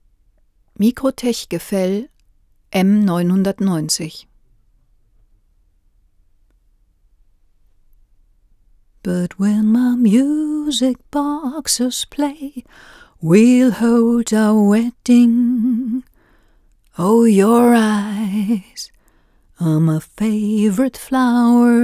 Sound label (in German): microtech gefell m 990 gesang